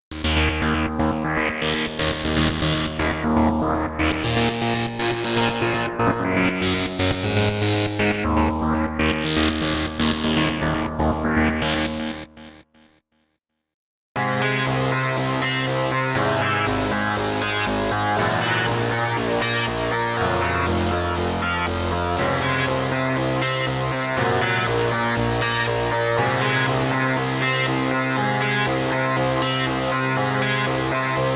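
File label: aggressive edges seq led sfx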